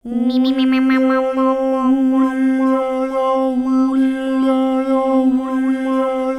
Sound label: OO LE LA LO, HUMAN SYN; Spectrasonics; Vocal Planet; Jazz FX